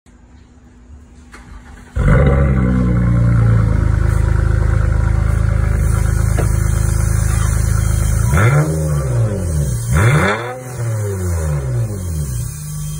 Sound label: acura, ماشین آکورا